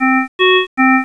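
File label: perfusion medium; alarms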